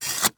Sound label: Industry Tool Shovel Small Metal Shovel Stabbing Dirt